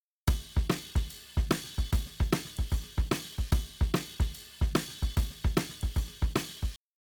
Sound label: Drums, shuffle drums; WDR2